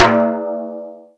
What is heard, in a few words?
timbale, Yamaha TG-500